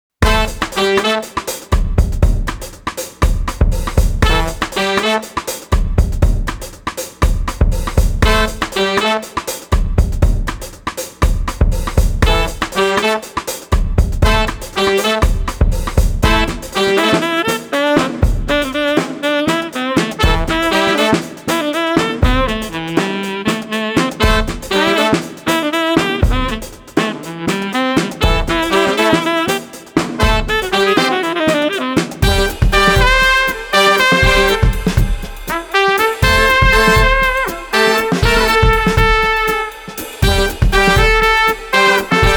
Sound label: Brass Jazz Party 120